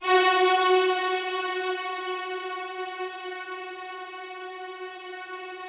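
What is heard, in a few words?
Rough Strings F#3, Yamaha VP-1; 90 s Sample CDs; Music Store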